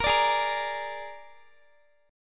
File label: G sharp Suspended 2nd Flat 5th, Guitar; strummed